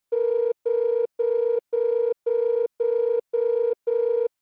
OS Sound Ring

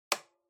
Calculator Switch

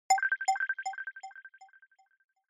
Short Accent Delay

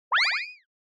Motion Pop

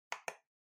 Trackpad Click Far R

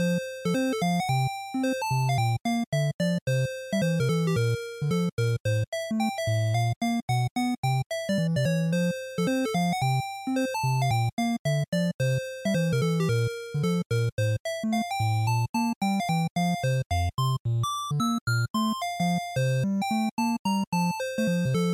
General Stage Loop, bgm

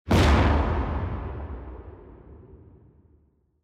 Cannon

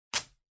Sellotape Peel Off

